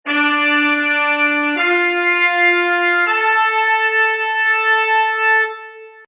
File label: trumpets Dm, Orchestral trumpets loops Dm 160 bpm.; Brass Loops